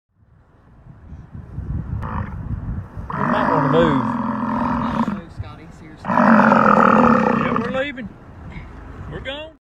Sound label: European bison; ویسنت